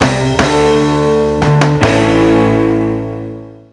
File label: Music Loss; hot wheels battle force 5 2009 nds gamerip